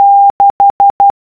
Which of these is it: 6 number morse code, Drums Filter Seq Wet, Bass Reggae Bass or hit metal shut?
6 number morse code